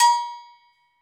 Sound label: Afr Agogo; Club-50 - Foundations Roland; Agogos; Fiji